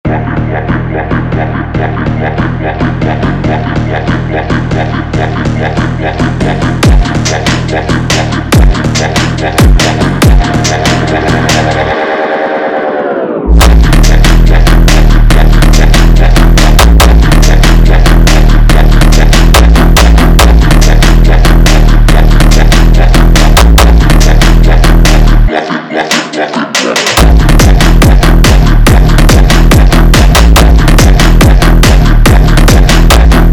Bomba Funk slowed Brazilian Phonk

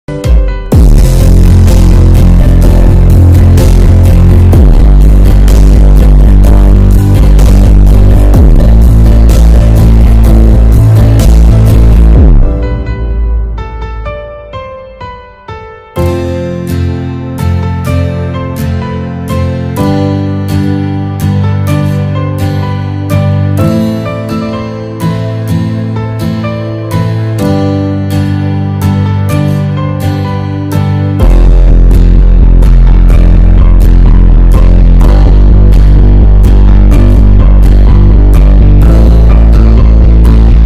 instrumental

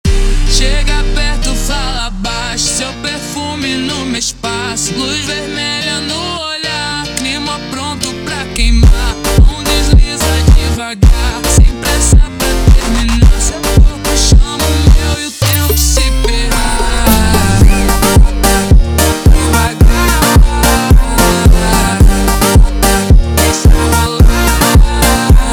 Montagem slowed

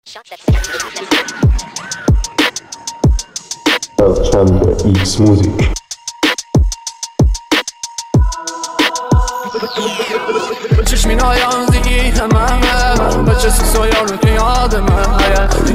Phonk, speedup

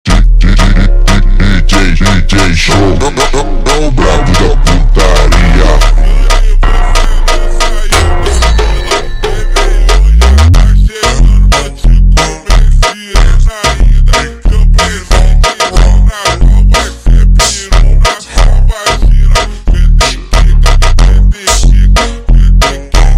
Funk ultra Slowed

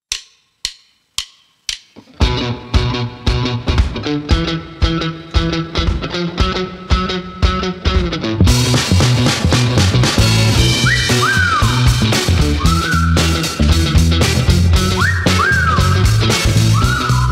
Whistle Funk Rock speedup; Royalty Free Rock

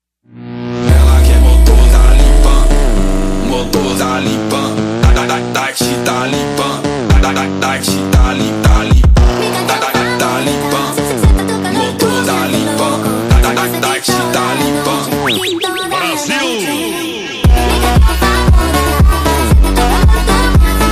BAILE VIBE

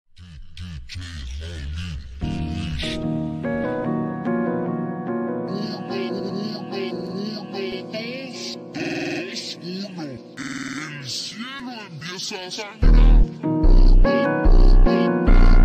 FUNK (Ultra Slowed & Reverb) Phonk Version Funk

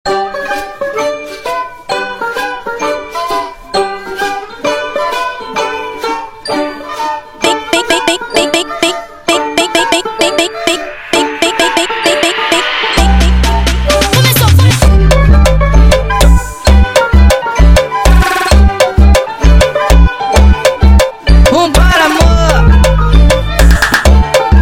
Funk, Brazilian Funk

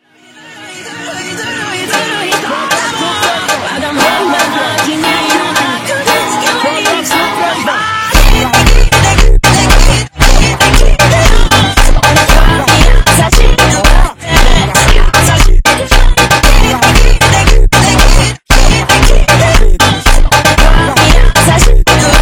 FUNK Slowed

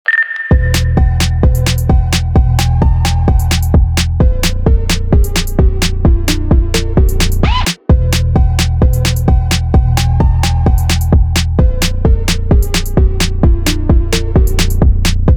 phonk Remix

